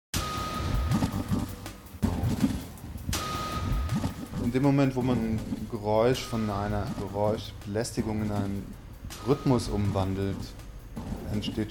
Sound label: noise kicks